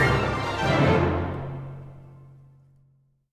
Music Generic Heroic 144bpm Mix Stop

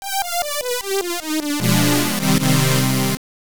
Game Over Sound; Dragon Buster; X68000

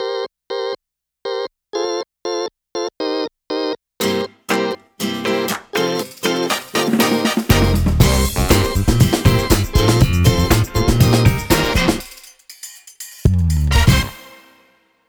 Intro Music; Piczle Colors Demo

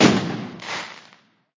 Bomb burst

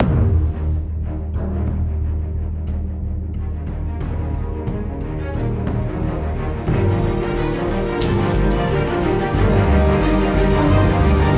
Boot Menu; batman the telltale series

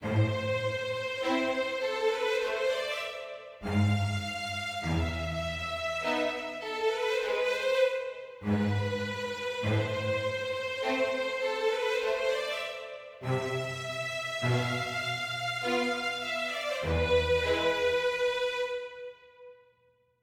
mysterious forte

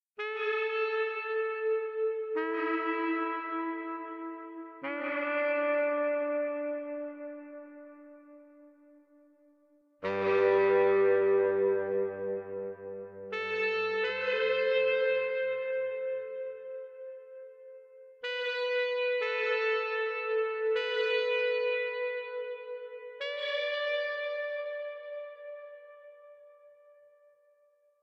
Sax Player Theme; gamerip; door to door

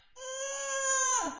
Shitting small shit

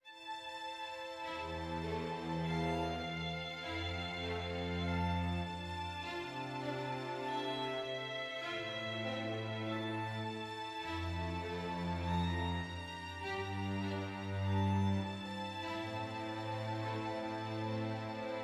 adventure med

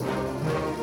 Music Generic Heroic 144bpm Start

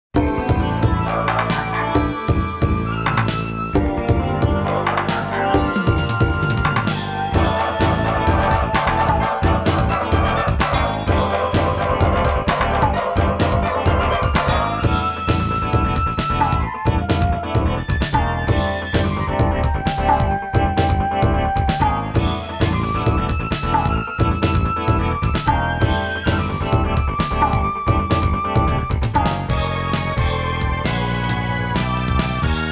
Trap; Sengoku Blade

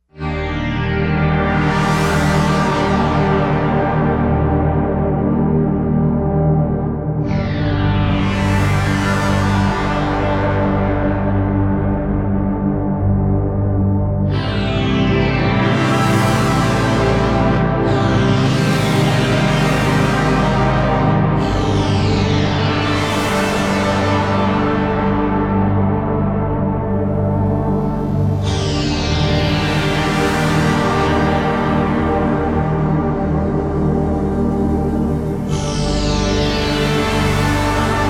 City Ruins Stem; Epitasis

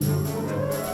Music Segments Tension Theme Bpm128 Start; asterix obelix slap them all 2

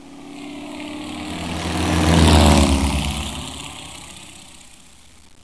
Amb Plane

